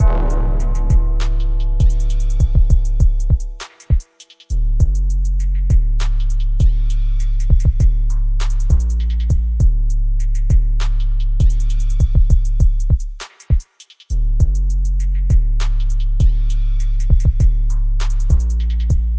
tha gang trap, drum loops and breaks, 100 bpm; 091 to 100 bpm

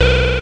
futurephone, Electronic Hits

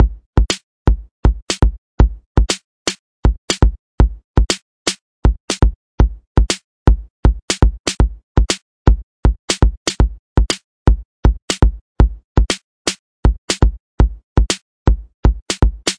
120 Beats, 120BPM; Breathing Circle

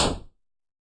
8 bit kit hit; LO FI and 8 BIT KITS; 8 Bit Videogame Kit

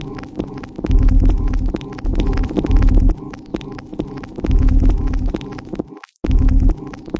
haunted filter; LOOPING AMBIENCE

